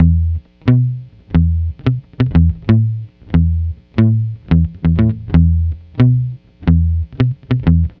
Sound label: Lounge Bass E; Zero-G Kocktail Kollection; Construction Kitz; Welk Lounge 090; 90 s Sample CDs